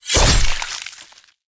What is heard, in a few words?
pierce chest